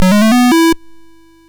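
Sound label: Mountain King, MOS 6581 SID chip music, games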